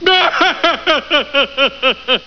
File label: haha; cstrike